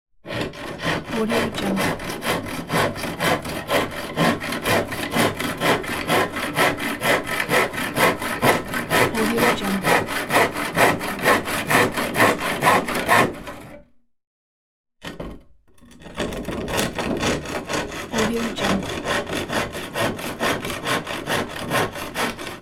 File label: sawing